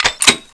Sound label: gatling clip out, weapons